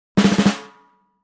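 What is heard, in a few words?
Drums Snare Short Crescendo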